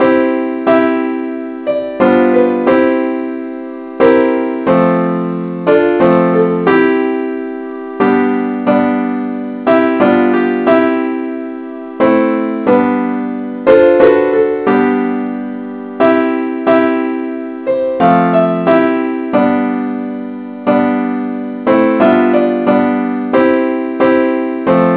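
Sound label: simple accompaniment key C; i need thee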